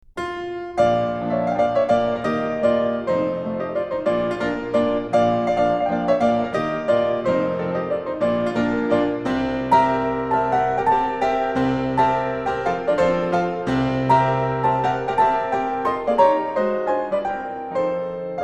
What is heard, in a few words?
Mazurka in B flat Op. 17 No. 1, The Piano Works; Chopin; Mazurkas